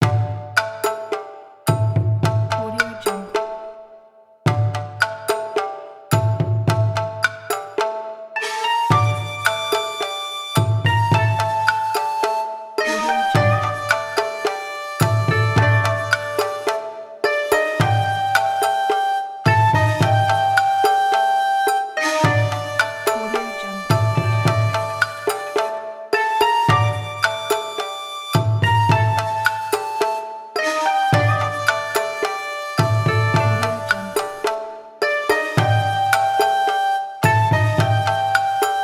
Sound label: japan edo rhythm